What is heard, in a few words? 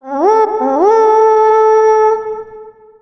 Buff banner horn